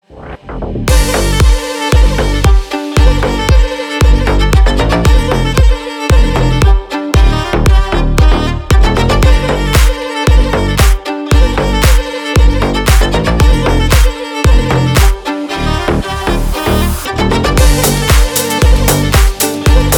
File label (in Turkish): remix rington; turkish